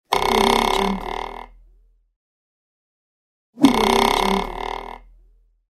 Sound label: vibrating wood sfx